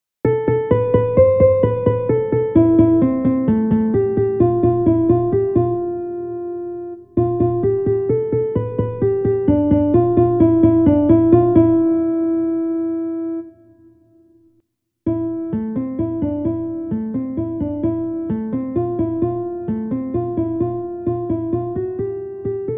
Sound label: santoor